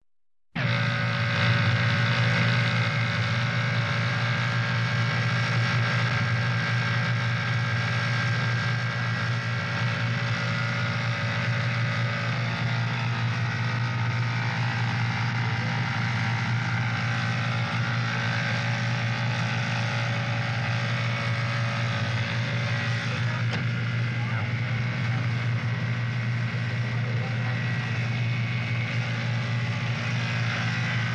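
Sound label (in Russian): звук электро-трансформатора гудение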